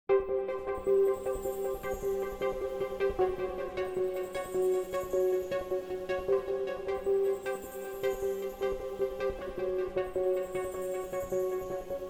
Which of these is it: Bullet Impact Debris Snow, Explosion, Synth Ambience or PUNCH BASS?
Synth Ambience